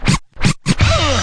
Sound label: turntable uh, Scratching and Turntablism; DRUM LOOPS and BREAKS